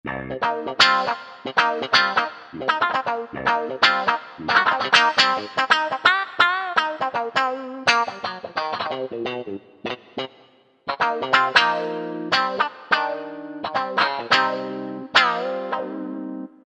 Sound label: T-WAH GUITAR, Roland SC-880; Factory Patches